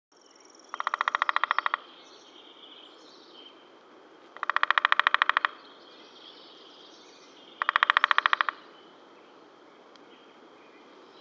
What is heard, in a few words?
woodpecker hairy, birdsong